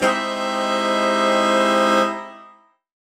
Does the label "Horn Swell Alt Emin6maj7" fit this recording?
Yes